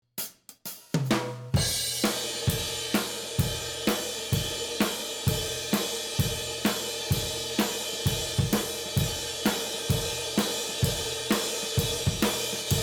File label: OVERHEADS